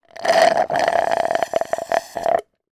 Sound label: slurp last of drink through drinking straw; food drink